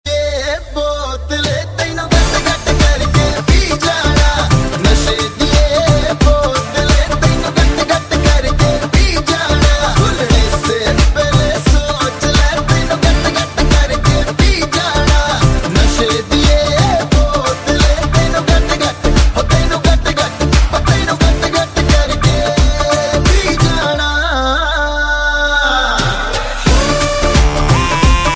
ringtone; dream girl

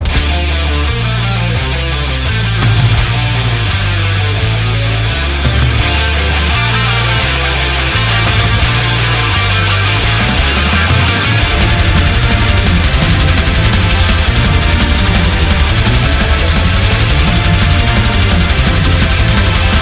guitar ringtones

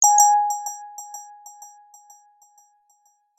samsung ringtones

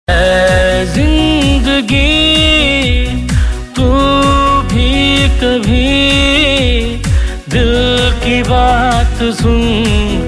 ringtone

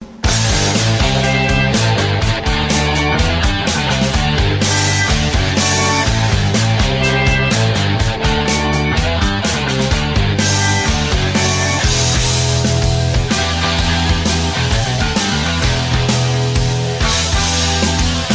guitar ringtone

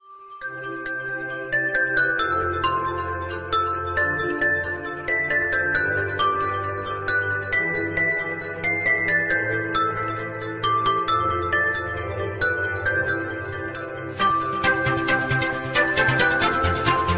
merry christmas instrumental ringtone

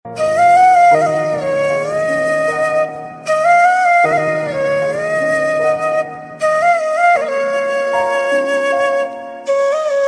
sad flute

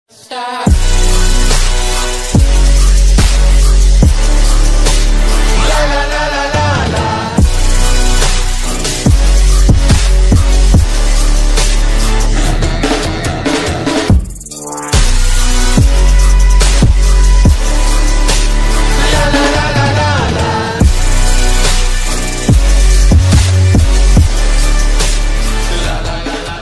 instrumental bgm ringtone; extraction movie

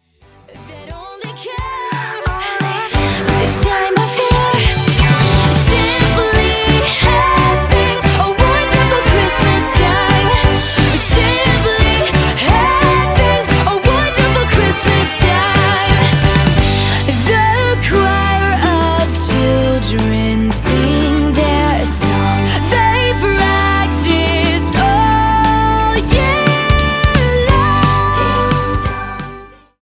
wonderful christmas ringtone

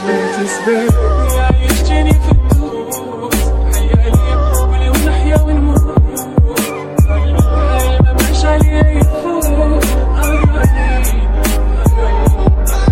best samsung galaxy turkish ringtone

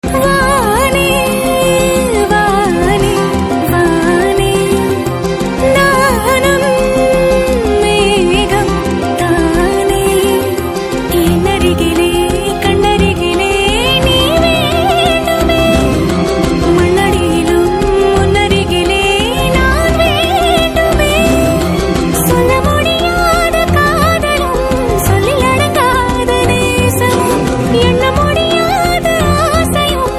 tamil movie ringtones; viswasam

